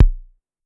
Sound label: Kick Electronic, Drum Machines DDrum-AT Pitched Down